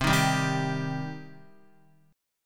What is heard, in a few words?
C Minor C down Guitar; strummed; JGuitar